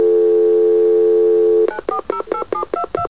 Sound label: TEL DIAL; Talking E-Mail